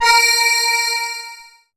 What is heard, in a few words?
BELL-GONG, A#; Big Fish Audio